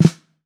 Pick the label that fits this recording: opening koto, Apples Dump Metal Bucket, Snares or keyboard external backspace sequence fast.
Snares